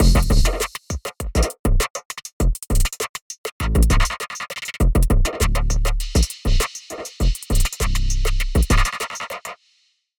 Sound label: Drum Lofier